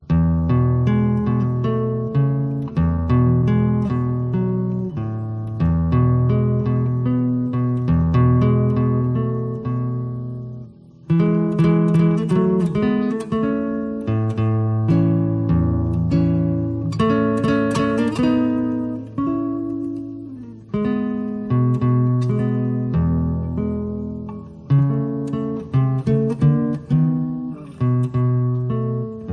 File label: guitar